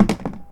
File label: plastic barrel soft; Dystopia; physics